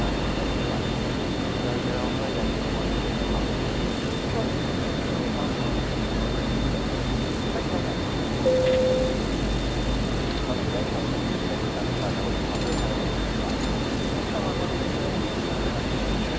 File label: onboard ambience